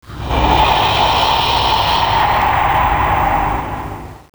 warrior idle

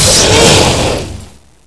alien attack

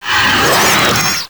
warrior hiss

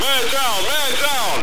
radio

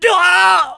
death; jihadist

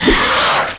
claw miss

